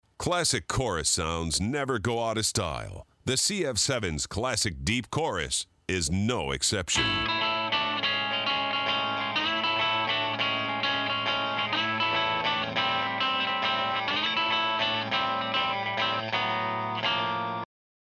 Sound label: Deep Chorus